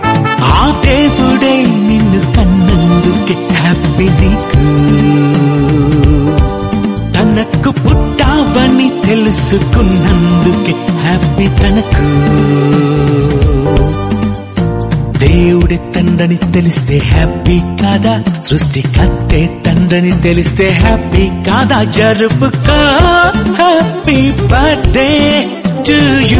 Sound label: Telugu Birthday Song